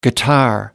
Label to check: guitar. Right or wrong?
Right